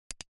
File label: OS Sound Mouse